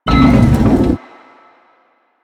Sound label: Sliding Door Chime Open; Warframe; Ambience; Nineteen Ninety Nine